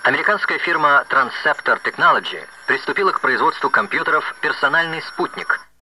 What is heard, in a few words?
all 126 bpm